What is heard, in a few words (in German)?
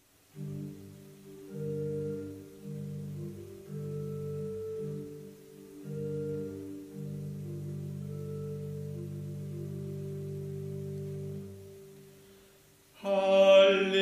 halleluja